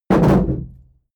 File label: shield sheet impact clatter soft